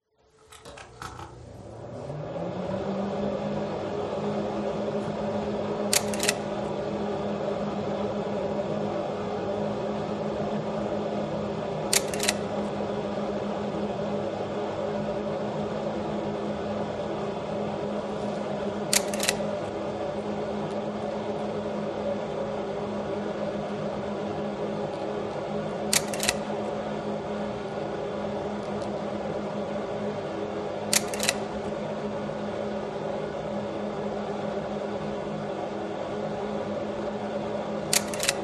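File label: Slide Projector Clicks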